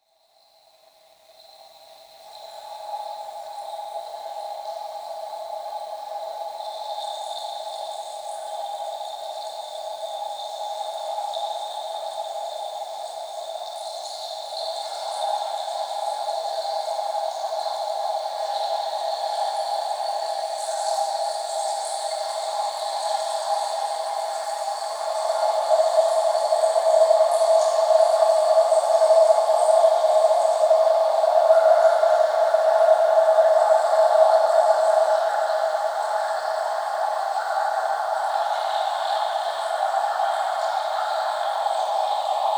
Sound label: Water Stream Well; Chalice Well